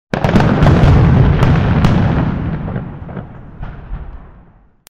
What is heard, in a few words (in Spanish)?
fuegos